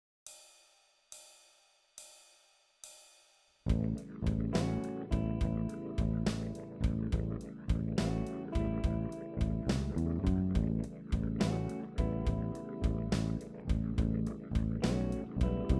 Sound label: backing, blues guitar; john wheatcroft; nailing the changes through blues guitar solos